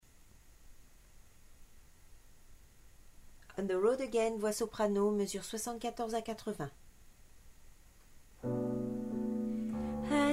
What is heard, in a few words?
soprano